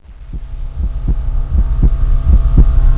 stasis start; quake2; railz

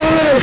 pain; quake2; tourney; EVA-01

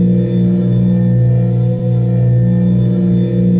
dark; quake2; redux

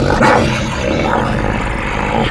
hound launch; quake2; coop; monsters

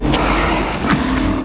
gurp; insect; quake2; tourney

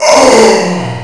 death; quake2; mnhcoop

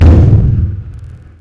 thud, tank; quake2; mnhcoop

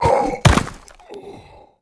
death; quake2; quakeguy

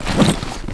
reb off, items; quake2

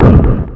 bump; quake2; coop; Hueteotl

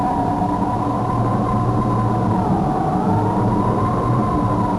wind; quake2; redux

